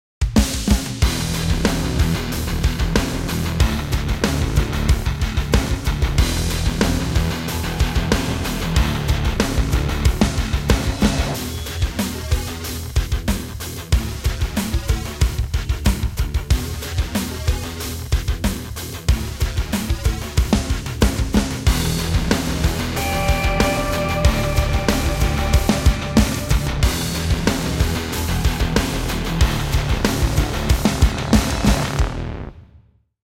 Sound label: start screen loop; guns n glory zombies android game music